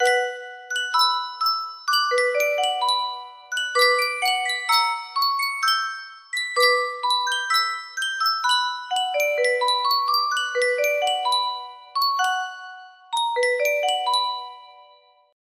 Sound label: Sankyo music box, music box melody